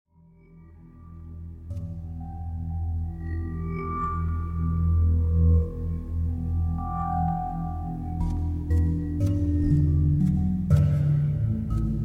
Shakuhachi

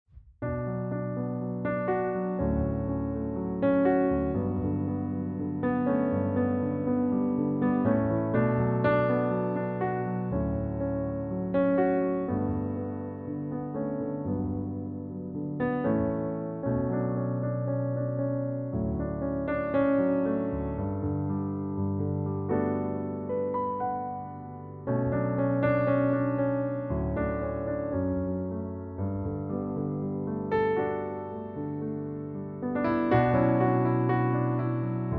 Piano Version

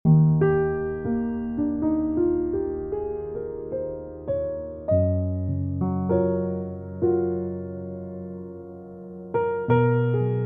Jazz For Sleeping

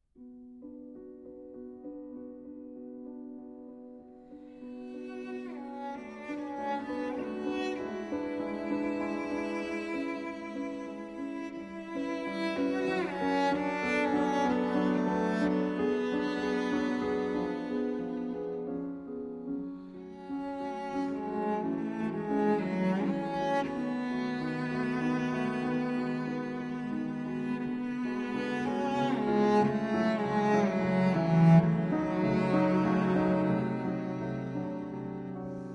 Prelude in B Minor; 3 Morceaux, Op. 11; Lyadov; Transcr. For Cello

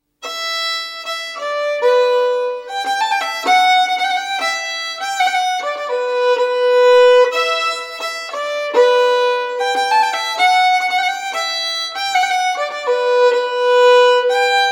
Polska; Efter Ris Kersti

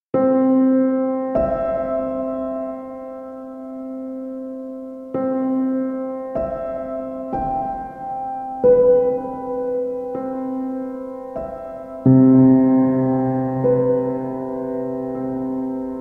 Piano Sounds

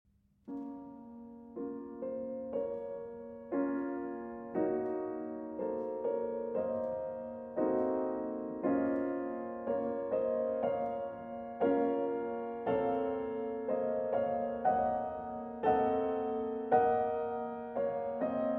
Prelude in B-Flat Minor; Prelude & Fugue in B-Flat Minor, BWV 867

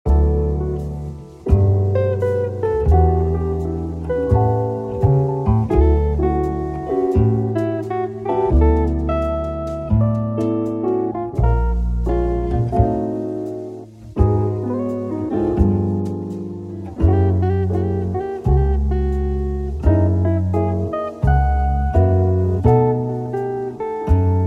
Restaurant Background Music

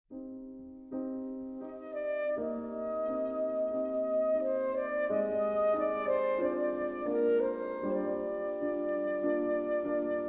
Vocalise, For Saxophone and Piano; 14 Romances, Op. 34 No. 14

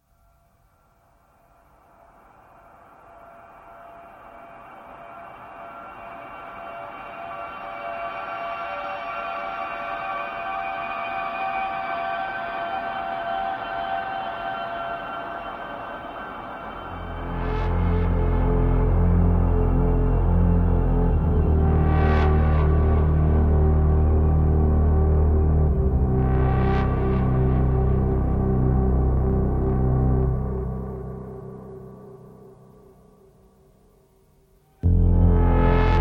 Instrumental

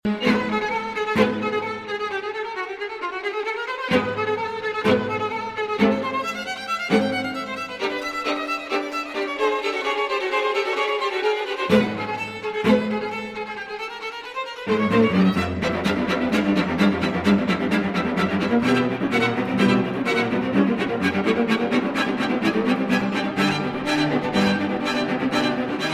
String Quartet in C minor, Allegro molto

